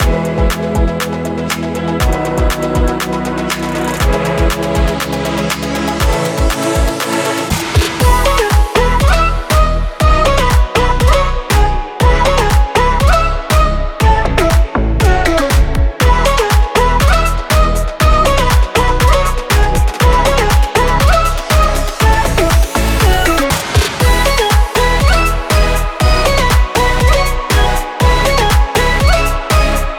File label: EDM Pop